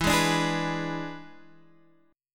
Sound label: E 7th E down Guitar Standard; E7 strummed; JGuitar